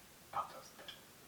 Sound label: isolated Pop